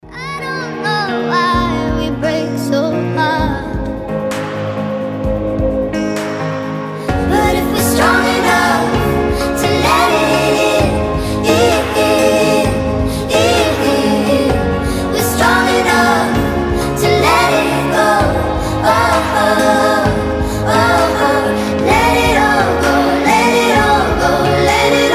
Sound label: sped up nightcore sped up version